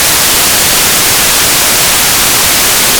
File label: whitenoise